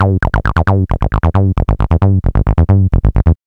B-LOOP

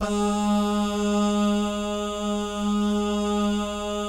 "bah" guy, Vocal Jazz Fx

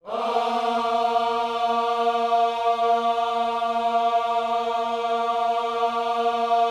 OHS A#3, Gospel; Spectrasonics; Vocal Planet Vol.1 Gospel; akai

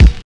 BD

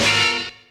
HORN; ZERO-G; Datafile

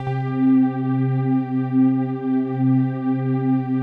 PAD, ATMO PADS; Best Service; XX Large

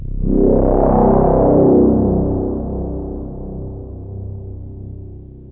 BASS; ZERO-G; Datafile

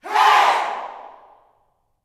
"HEY", Gospel, YELL SHOUT; Spectrasonics; Vocal Planet Vol.1 Gospel; akai

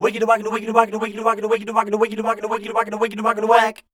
scat

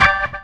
ORG RIF; ORGAN; E-Lab; X Static Goldmine 2; akai

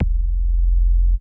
BASS; ZERO-G; Datafile

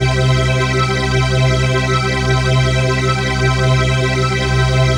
PHASE PAD, ATMO PADS; Best Service; XX Large

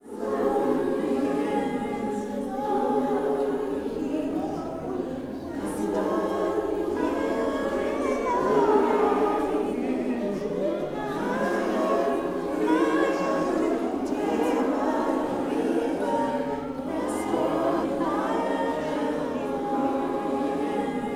SPIRIT SONG, Vocal Planet Gospel; SPIRIT SNG

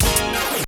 stab; ZERO-G; Datafile

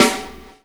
SD ROOM, snares; E-Lab; X Static Goldmine 2

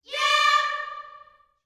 "YEAH", Gospel vocal; Spectrasonics; Vocal Planet Vol.1 Gospel; akai

